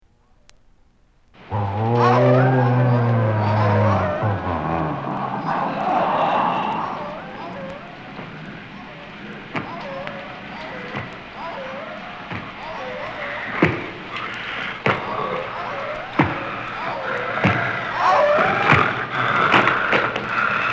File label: Monster Stalking; Halloween; Haunted House